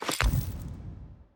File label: Backpack Close; Custom UI Sounds; Dying Light 2 Stay Human